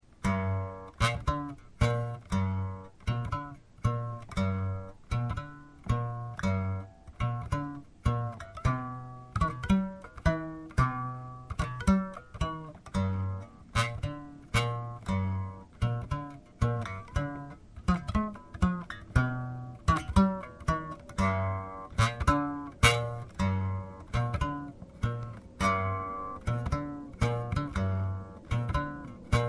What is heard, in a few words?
leftovers guitar